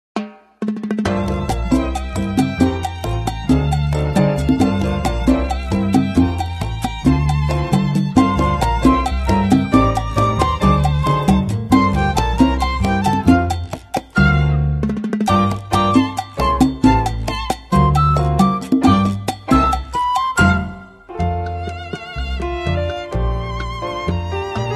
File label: cuban traditionals